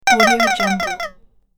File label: boingy cartoon alien effect